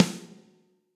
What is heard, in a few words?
Snare & Press Studio Basic; Drum Hits; Ableton; Factory Packs; Session Drums Studio; MPE Hits